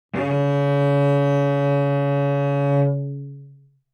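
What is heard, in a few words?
Cello arco fortissimo sul G Db4; MIS Pitches - 2014; Strings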